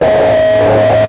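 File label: Distortion Alert mid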